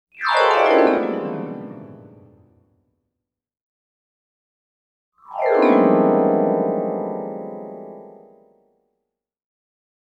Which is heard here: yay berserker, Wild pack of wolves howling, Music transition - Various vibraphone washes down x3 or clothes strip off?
Music transition - Various vibraphone washes down x3